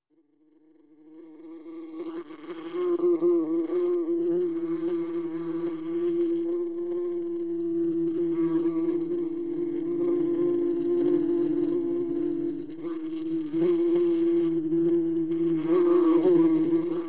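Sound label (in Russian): Осы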